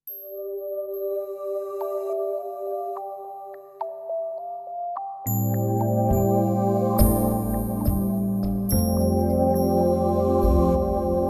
Symphonic

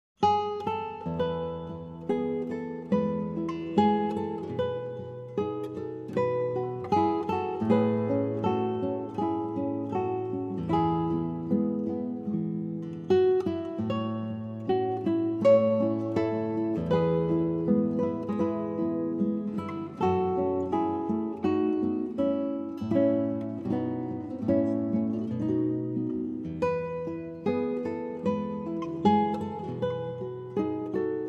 Acoustic Praise Instrumental

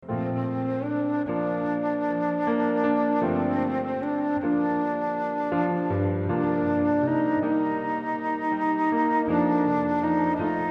acoustic praise, instrumental

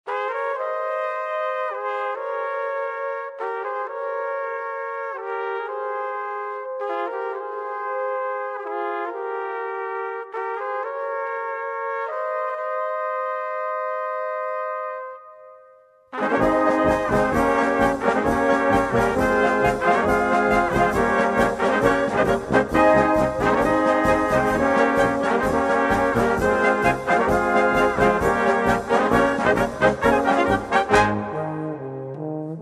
Fanfara

